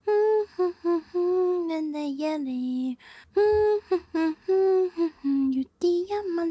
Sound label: Idle Hum